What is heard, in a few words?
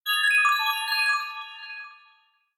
Spell, macOS system sounds